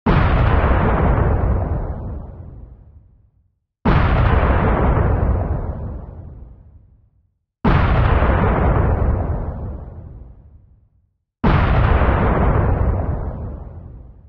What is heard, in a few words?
bomb blast